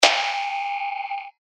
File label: PERC G Doom, percussion; revealed percussion vol 2